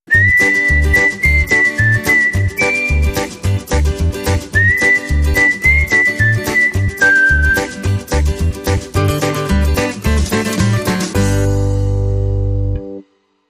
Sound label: Whistling